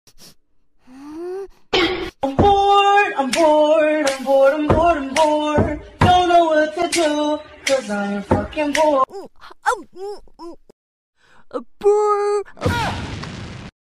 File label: funny bed meme; TikTok